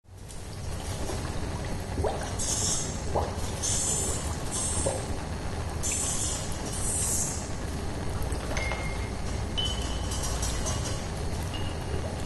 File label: laboratoire laboratory ambiance ambient soundscape; jdr rpg